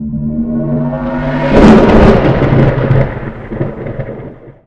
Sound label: hyperdrive; endless sky